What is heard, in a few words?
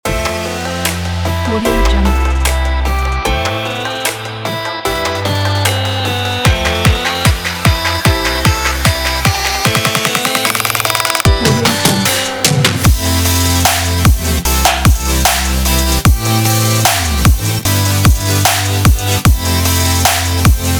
future bass